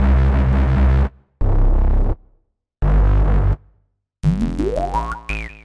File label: Jungle Bass in C